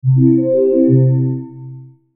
Logo Soft Synth Ascend; Melody Descend; Sonic Logo; Blastwave

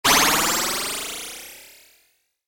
Generic Bonus Or Pickup, video game; Video Games; Stuart Duffield

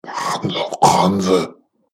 Alien Monster Voice

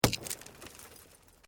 Metal Light Movement Minor

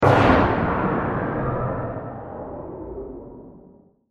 Blast Laser Robotic Power Down; Production Element Imaging Element Accent Transition

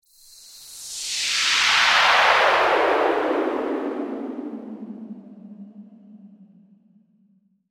Bright Science Fiction UFO Flyby Transition; Crafted Signals

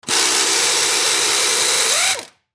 Air Gun Drill Rev Tighten Long Pneumatic; Drills; Industry; Blastwave

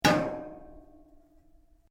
METAL ON METAL; Metal (Sound Effects:Materials)

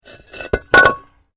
Brick Medium Hollow Fall; Material components for constructing more complex sounds

